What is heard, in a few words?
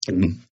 Gulp, Eating; Human; Partners In Rhyme